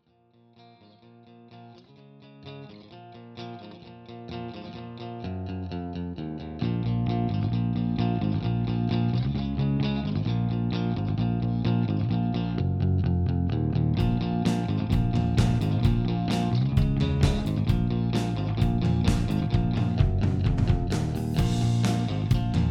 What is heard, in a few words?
Alt Rock